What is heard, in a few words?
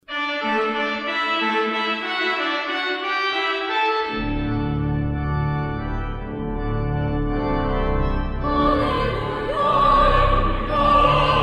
Easter Fanfare; Selah Recordings